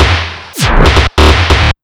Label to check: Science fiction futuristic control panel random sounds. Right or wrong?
Wrong